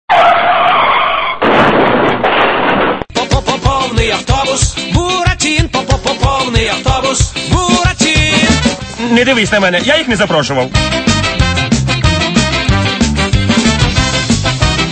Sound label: call; buratina